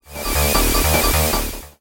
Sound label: stun; Garry's Mod; vinagre npc pack 1